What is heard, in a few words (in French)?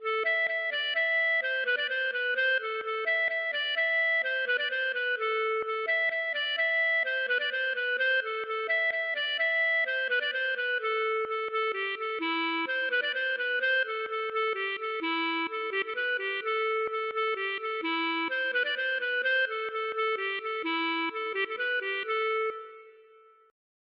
bourree